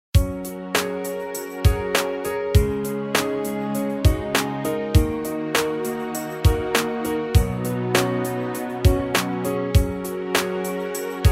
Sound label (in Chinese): 专题片背景音乐